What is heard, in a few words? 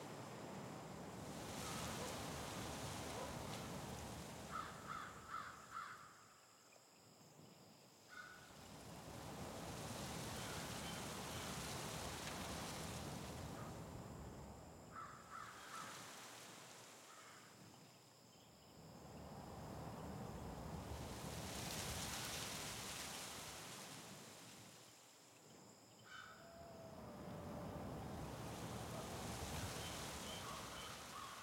amb